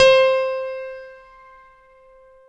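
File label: dance piano C4; Best Service Advanced Media Trax Modern Composer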